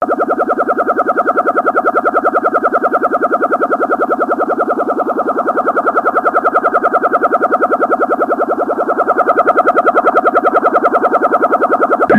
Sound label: Bubble Jammer